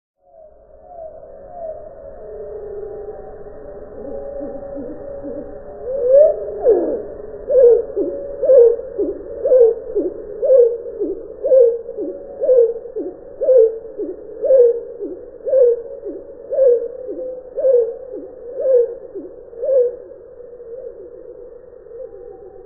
piegża, 8-krotne zwolnienie śpiewu piegży; wroblowe; zapozyczenia

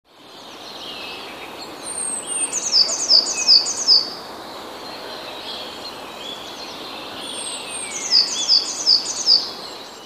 modraszka

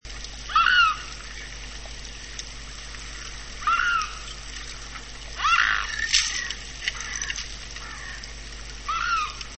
łabędź niemy - trochę inne chrapanie

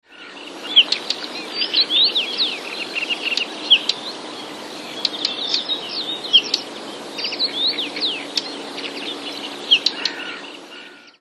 poklaskwa, nawoływania prawdziwej pokląskwy; wroblowe; zapozyczenia

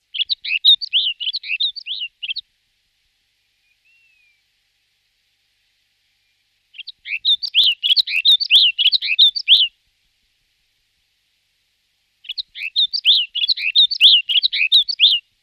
śnieguła - inna piosenka

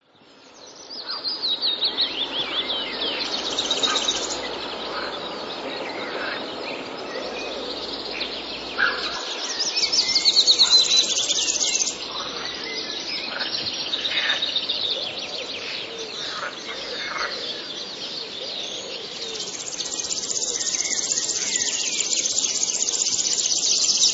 strumieniowka

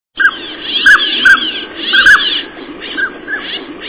rożeniec, głosy; wroblowe; anseri

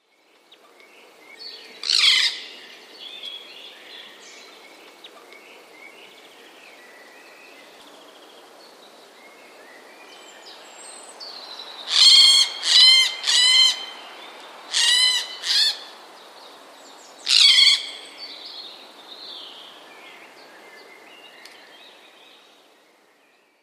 sojka; dźwięczne okrzyki (24.04.2011); z którymi sójka gdzieś odleciała. Pewnie to też był jakiś alarmowy sygnał

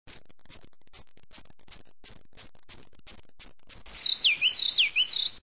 słowik modry - inna fraza; luscinia; wroblowe; turdidae

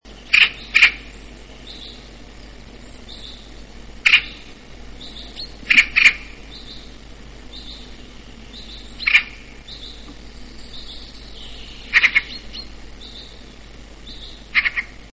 sroka, krukowate - corvidae; posłuchaj moich własnych nagrań srok

